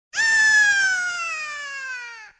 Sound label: ahh